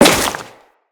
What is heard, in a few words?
Crewman Step Dirt, Footsteps; Enemies; Corpus